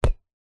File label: ball small catch hands; sport